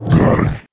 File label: attack, male zombie; nmrih; zerosiki; slender